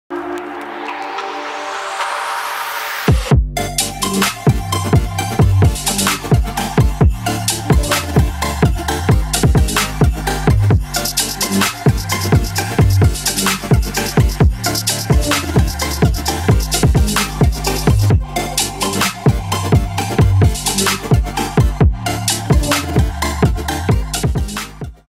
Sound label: Future Trap Vibes; Evil Needle; Loopmasters Artist Series